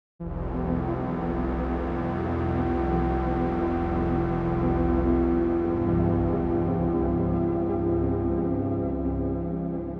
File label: Synth Massive